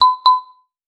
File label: new chat